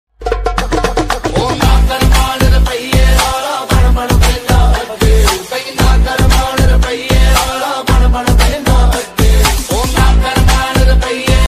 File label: sms ringtone notification ringtone